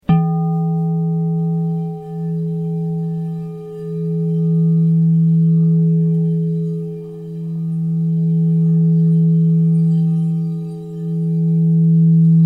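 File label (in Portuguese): FOSCA E3, instrumentos de quartzo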